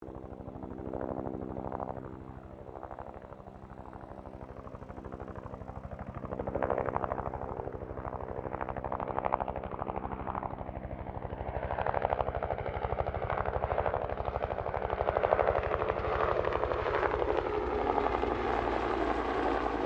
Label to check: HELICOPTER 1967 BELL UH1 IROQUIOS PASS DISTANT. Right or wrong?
Right